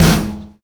Snare, drums; Sonic Foundry (Sony Creative Software); Drums & Drones; Construction Kits; Sludge Kit

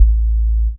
DR. LOOP, BASS; X-Static Goldmine 3

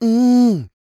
MMM, Vocal Blues Soul; Spectrasonics; Planet

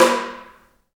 Kenyan Drum; Roland LCDP14 Africa VOL-2; Af.Hand Drm1

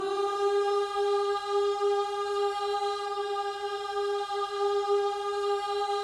OOH, Gospel; Spectrasonics Vocal Planet

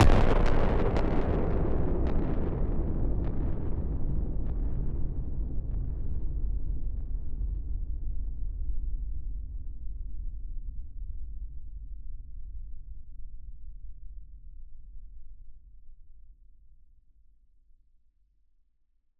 Blast, Explosions

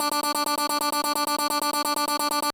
kawai k1 PULL BASS LOOP; wavetables

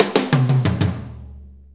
6-8 Fill, Drum Tools; Sonic Foundry (Sony Creative Software); Loops & Samples Drum Tools; Fills

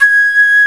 FLUTE G#5; E-MU Formula 4000 Series Vol. 5; Protozoa; Proteus2 Instrmt

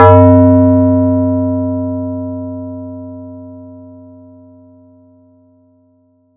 FX RND BEL; ModelCycles

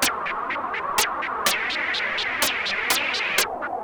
Juno-60 Arp 125BPM

